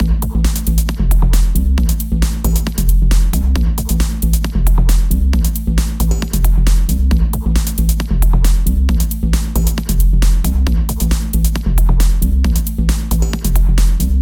135 ravey game loop

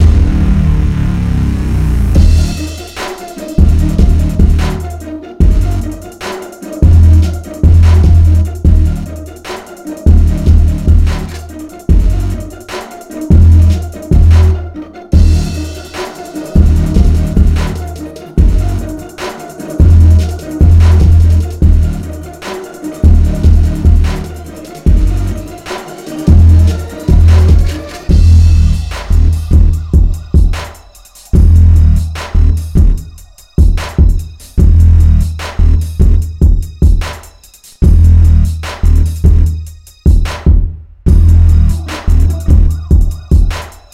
Radio Inside; Crackhouse Radio 2

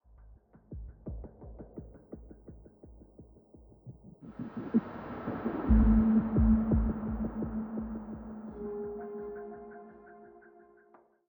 85bpm; Legends of Pegasus; windows gamerip 2012

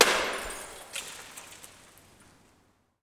glass break

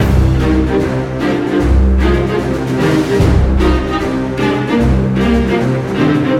sounds music; Halo Combat Evolved; Xbox

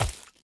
stalactite death

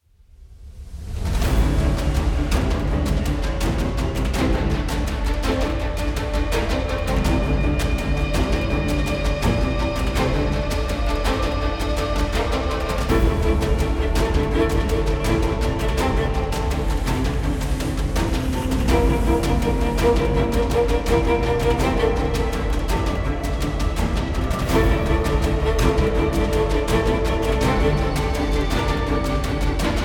BGM combat mob enemy; Babylon's Fall

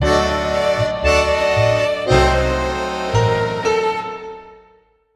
Tango Sequence; mass effect 3 citadel gamerip 2013; Silversun Strip

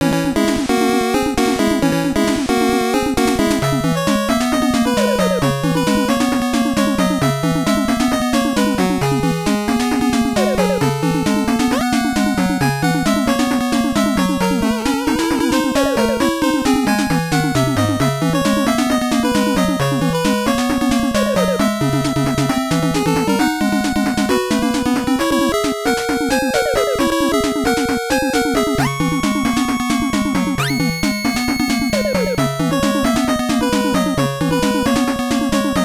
Jungle

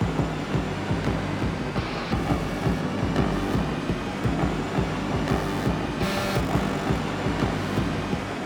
Music Winning Loops; Battlefield 3

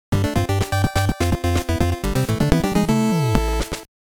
Bonus Game Success; the cowabunga collection

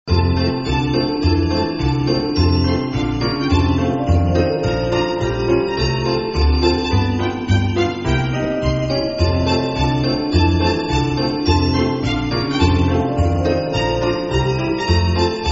Jingle Bells

